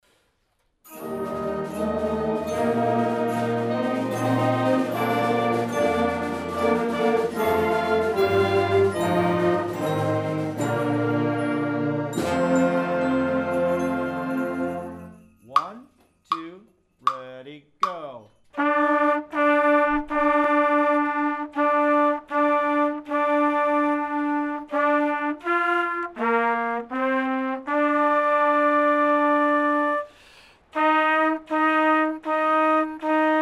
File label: jingle bells extreme